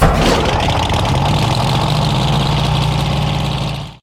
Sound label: boost